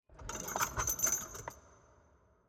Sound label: tripalium chain; ambience room torture